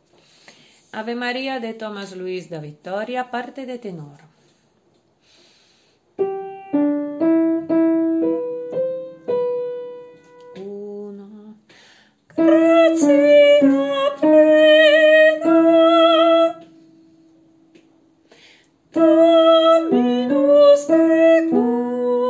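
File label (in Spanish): Ave Maria Tenor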